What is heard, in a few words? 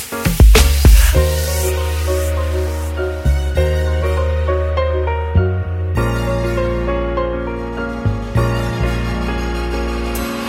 Short Melody